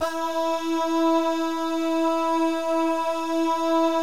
BAH GUY, BAHS GUYS

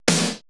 80S DRUM SET

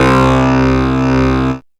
bass C, Rave Bass, Dance Synths

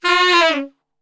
F#2 SAX SHF, Sax; Giga Samples Collection

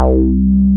Synth Bass, Roland

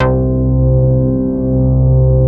C3, BASS; Q Up Arts; Dream Experience

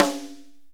snare med

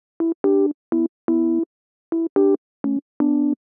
125 Rhodes Dom E, Keys, Nu Jazz House; PowerFX - Nu Jazz House

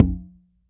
L CONGA BS; Cuba; AKAI S6000 CD-ROM - Volume 5; CONGA 2

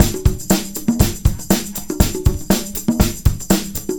Combo, R&B Drums

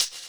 Shaker; Equipped Music; Loungin House

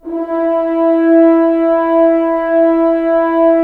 Horns; Roland - LA Composer vol. 4

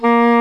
DOUBLE REEDS; OMI - Universe of Sounds

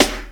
Dance Drums; Best Service ProSamples vol.15; AKAI

